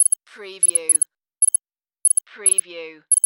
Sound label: Computer Readout